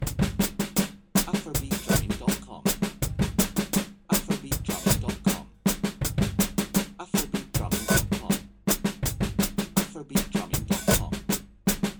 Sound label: multi syncopated afrobeat orchestrations